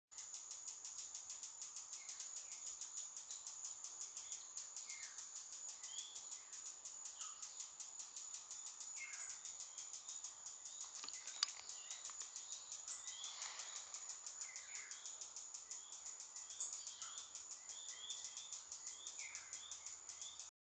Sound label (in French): Colibri huppé Orthorhyncus cristatus; Foufou